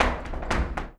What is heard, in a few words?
Flamenco, ZAPATEADOSOL